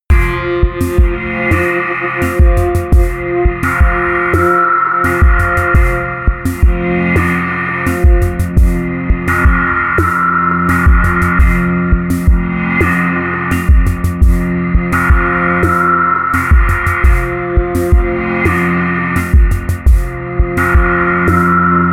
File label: sequence dark beat